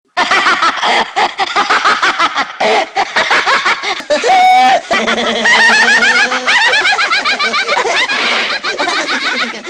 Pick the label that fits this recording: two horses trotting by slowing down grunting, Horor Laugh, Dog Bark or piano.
Horor Laugh